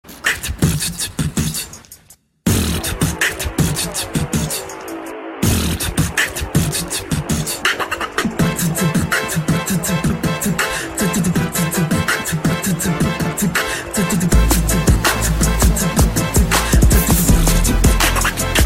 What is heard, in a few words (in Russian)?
prikolnij bit